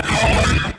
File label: down lofi scream